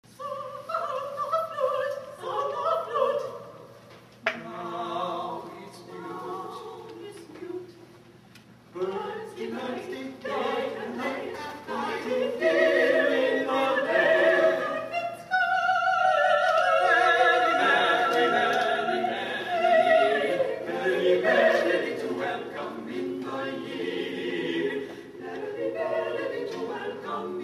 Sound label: Spring Quartet reverb